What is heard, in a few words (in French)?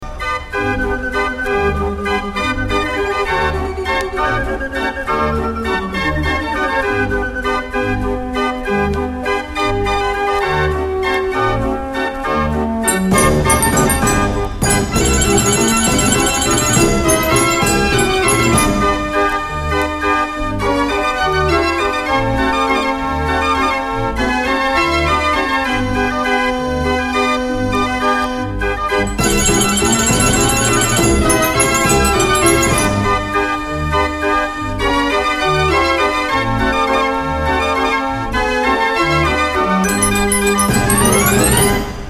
traditionnel pays-bas, orgue de barbarie